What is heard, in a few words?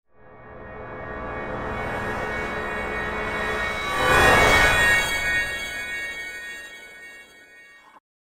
Goodbye Master Magician, magic sound effect; fantasy sound effect; Andrew Fleming